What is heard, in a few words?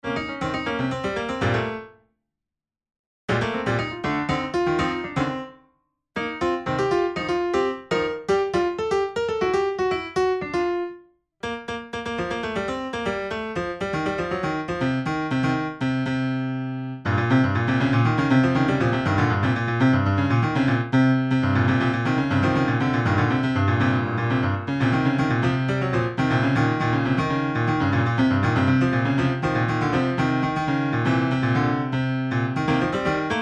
Piano Improvisation